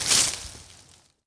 bush snap; Star Wars Obi Wan; XBOX

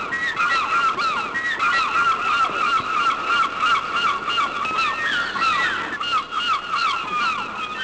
seagulls; Pirates Of The Caribbean

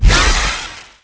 Ship Laser Shield

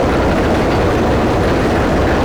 coaster; lasvegas

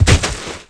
hit body, skater; Tony Hawk's Project 8; XBOX

